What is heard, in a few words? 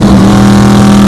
charger rev down; charger69; master chris